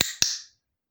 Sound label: dog click